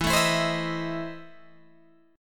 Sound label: E Major 7th Suspended 4th Sharp 5th, Guitar, Open G